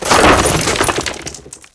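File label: break stone small; Spearhead Demo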